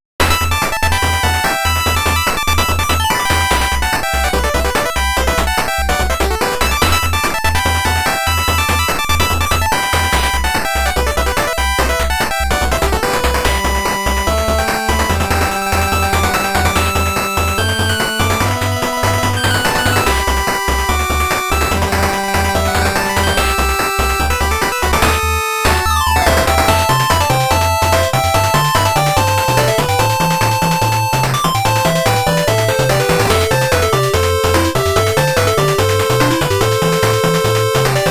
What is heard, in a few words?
8bit